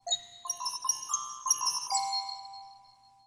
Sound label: Glockenspiel